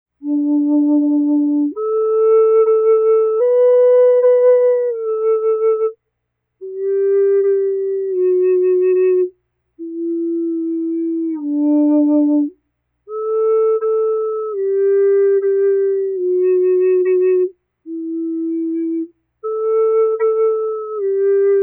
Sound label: Native American Flute; Twinkle Twinkle Little Star